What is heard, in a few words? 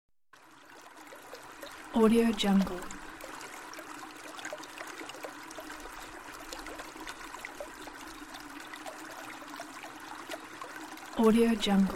forest stream